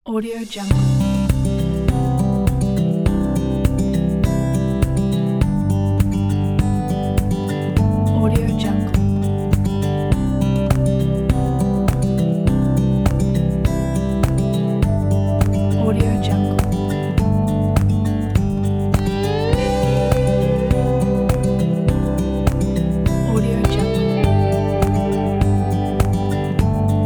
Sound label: acoustic folk